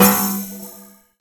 menu hit; tetrio

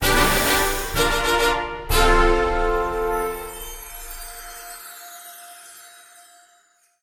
cutin superlobby; tetrio

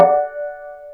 combo power; tetrio

